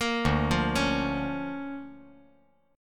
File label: D Minor Major 7th Sharp 5th D